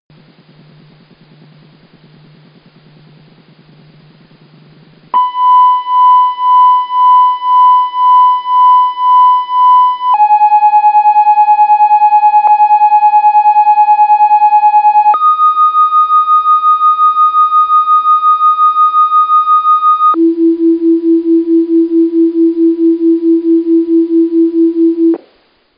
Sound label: combined fixed tones